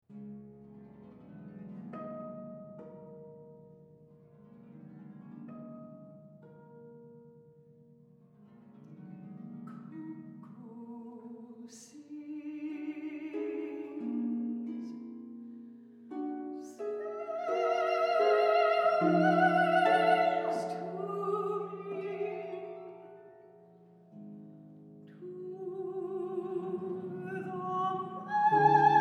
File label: cuckoo sings